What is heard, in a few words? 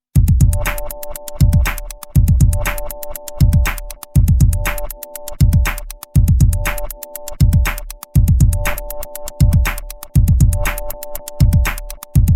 korg volca sample groovebox